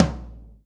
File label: TOM 16; Best Service; Alpine Volksmusik